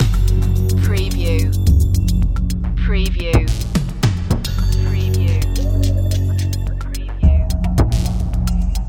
loop, chill

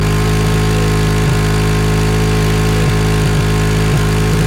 Quad Bike Loop RPM 4000 Exhaust Perspective

